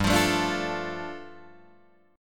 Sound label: G 7th Suspended 2nd, Guitar DADGAD